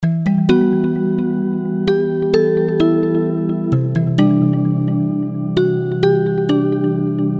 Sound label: Bell Guitar; sickrate; siik essentials iv